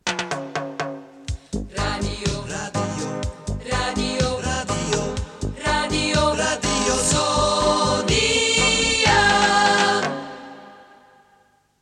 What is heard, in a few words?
Reggae, jingles radio; Radio Zoniën